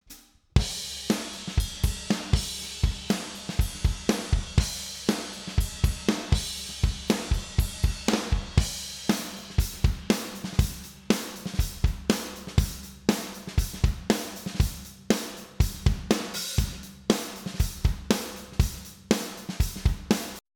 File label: drum